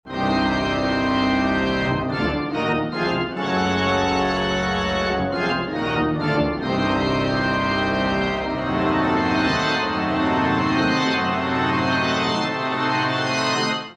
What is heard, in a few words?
intro music; ff2; ghostface